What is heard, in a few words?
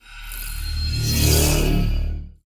electronic wave